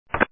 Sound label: Land